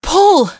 stalk pull; vo; source engine; episodic; episode 1; citadel

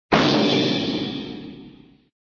bam; cartoon; hannah barbera cartoon sound fx

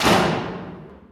default stop, doors; Left 4 Dead 2; Source Engine

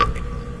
drip, weather; ambient; Source Engine; cstrike

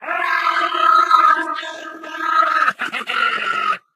ballbot jump

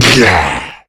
tongue launch, smoker; voice

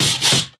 taunt bunnyhopper hop; video games; source engine; tf

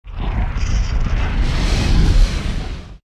hell rumbles; Source Engine; tf

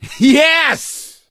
positive noise, gambler, survivor voice; source engine; left 4 dead 2

